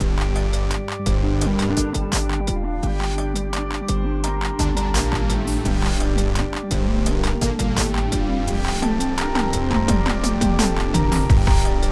Trap Hip Hop; Jamie Coleman, Film Composer

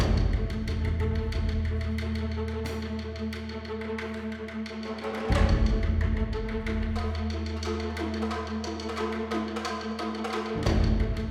SUSPENSE action

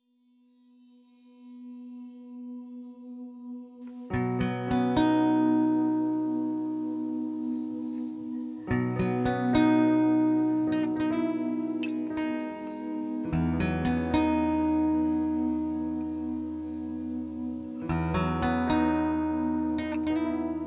tender conversation; Jamie Coleman, Film Composer

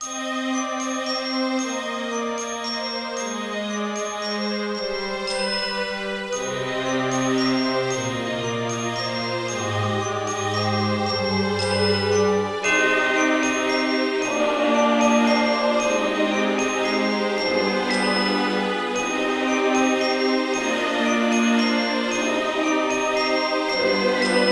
Epic Christmas choir

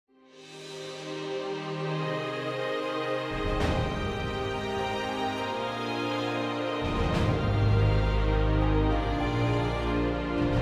trailer music only; PTA trailer sample; Jamie Coleman, Film Composer

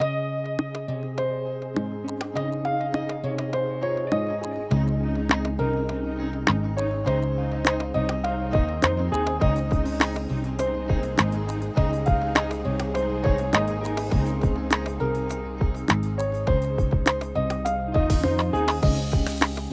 Gratefully Pleased 102 bpm; Jamie Coleman, Film Composer

